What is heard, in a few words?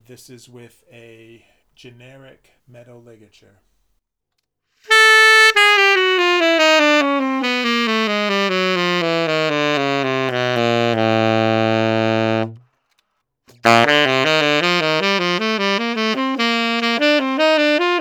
Generic Metal; Zac Lig